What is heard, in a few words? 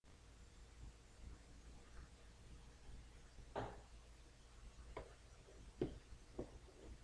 guitar